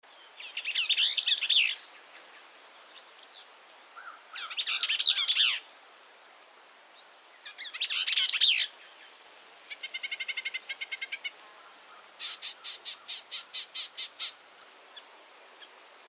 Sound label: VIREO BELL S, birds; species/birds; wbba